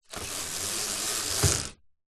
household blind shade pull cord room slight distance; zapsplat